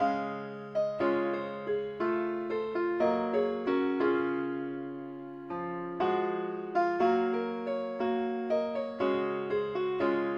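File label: keyboard only key c sharp; pilot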